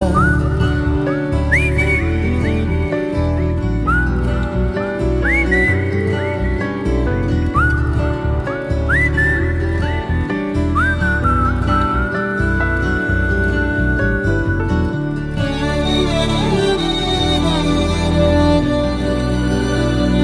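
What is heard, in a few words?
Love background music Ringtone; Naa Saami Ranga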